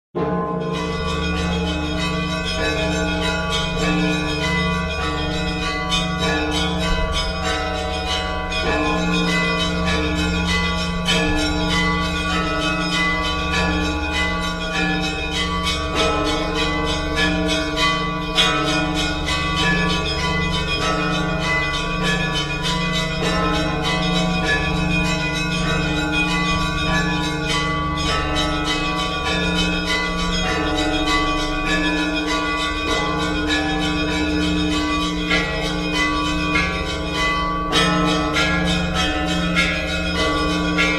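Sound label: blessed and healing ringing of bells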